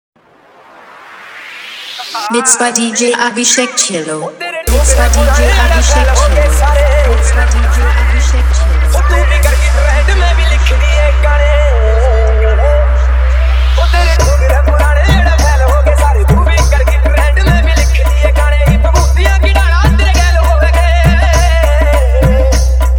Remix Haryanvi Dj Song

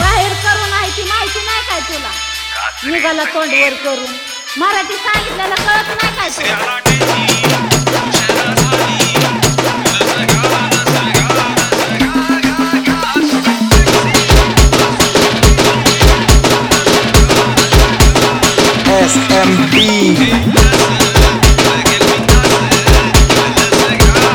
Halgi Marathi Remix

